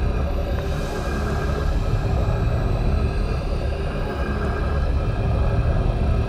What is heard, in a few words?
toxic god windup; Stellaris; toxoids